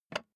office chair lever up

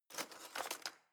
knife wood drawer grab

